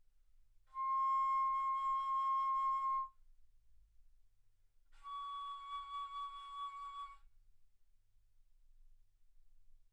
Bass Flute C6 Db6; ff

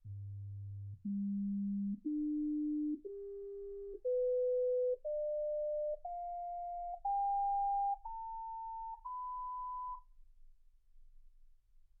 sinus tones 48kHz

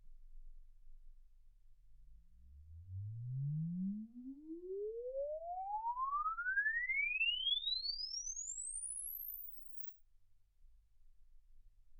exp swept sinus 10Hz 24kHz

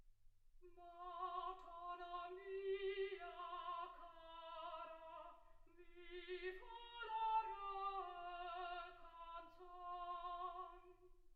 soprano

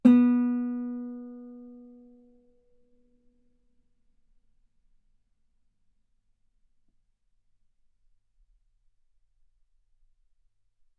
Guitar ff sul B B3; single mic

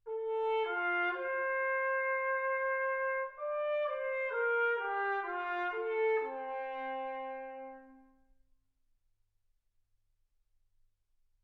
trumpet

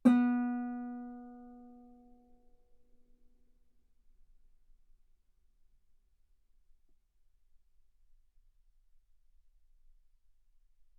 Guitar B3; ff; sul B

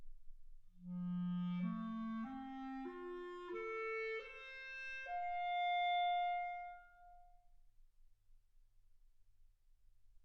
clarinet

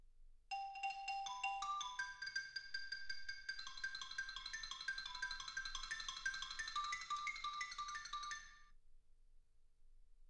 xylophone

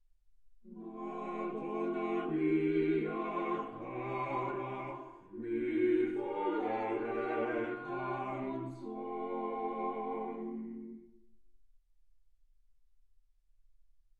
quartet

